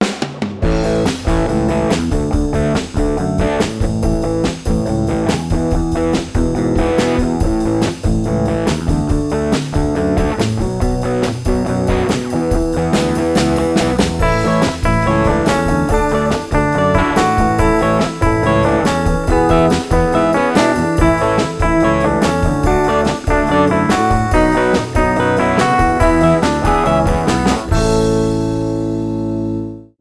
Cool Rockin Guitars

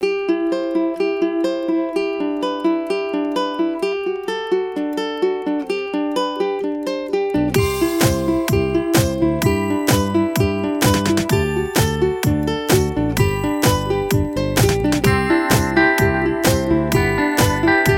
Happy Uke Tune, no melody

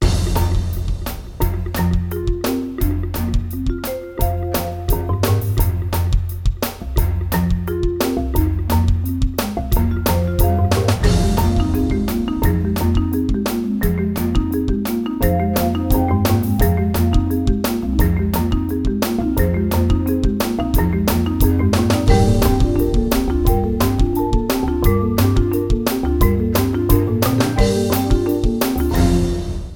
Mallet Energy

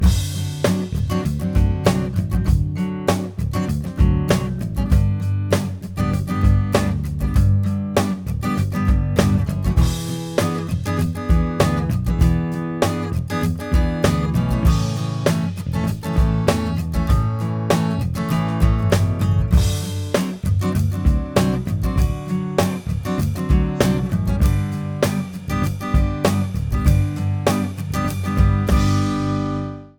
Slinky Groovy B (no keys)

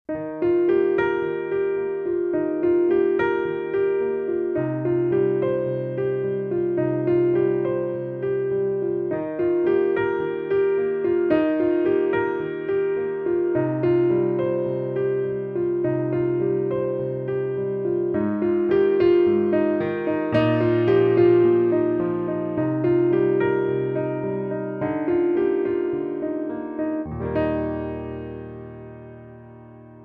solo piano

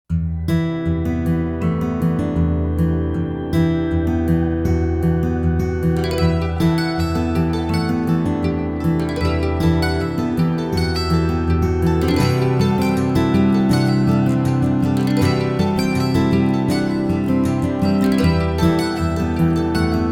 Mandolin Dreams

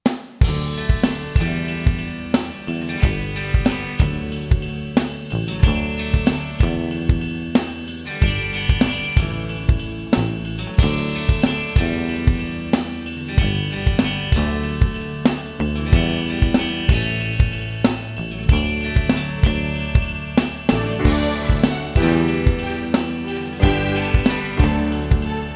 I Love This ALT (no ac gtr)

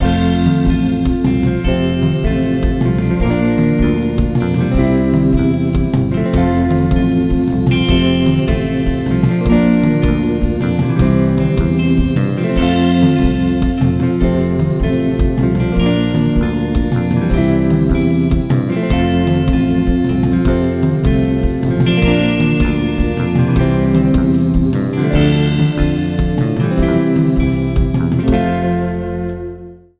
Beach Blanket Bongo

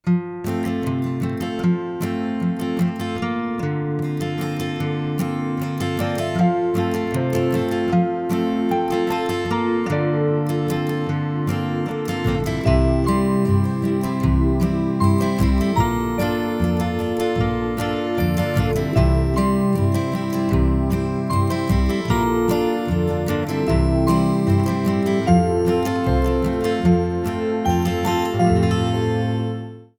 Relaxed Atmosphere

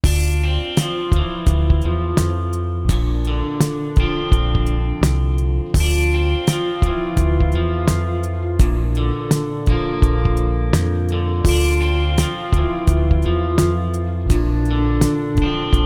no ac gtr

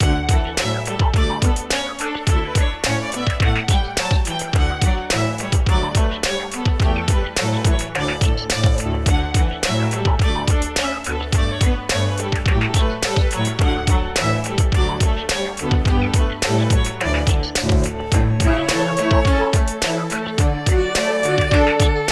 Classical Beat

